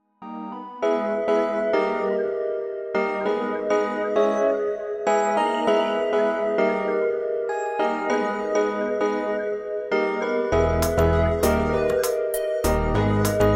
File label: Rhythmic Drive Music; MIUI12